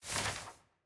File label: Map Open